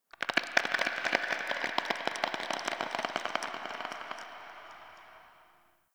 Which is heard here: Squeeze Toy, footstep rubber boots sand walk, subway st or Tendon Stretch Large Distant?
Tendon Stretch Large Distant